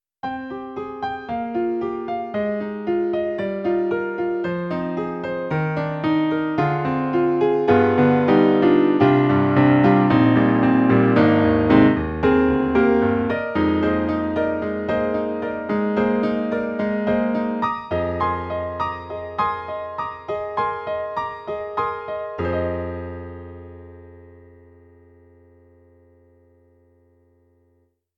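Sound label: Perfect Piano; E-MU Sounds of the ZR Expansion ROM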